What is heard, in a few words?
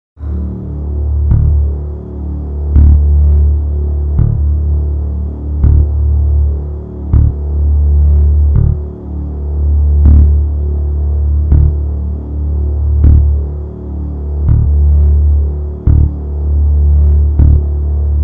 Pulse Atmospheric